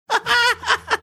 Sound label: cute laugh